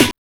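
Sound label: snare drum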